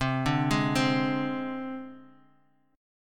C Suspended 2nd Sharp 5th, down, Guitar, Half Step Down; Csus2#5